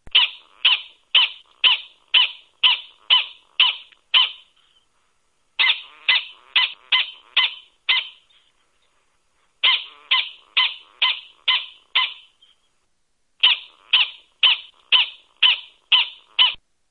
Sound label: Gunnisons Prairie Dog; Midwest Prairie Dog Shelter